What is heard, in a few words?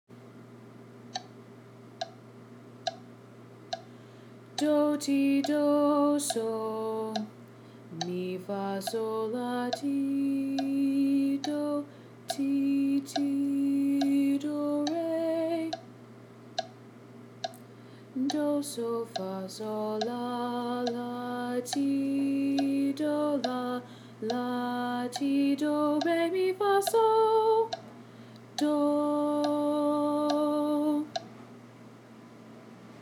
alto